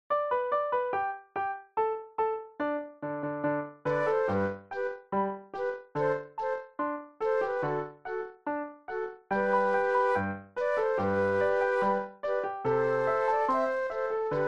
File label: Flute Duet and Piano; classical